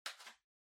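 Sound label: Ceiling Light Far remote control Cover Open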